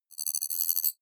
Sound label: digital rattle, signale; soundpool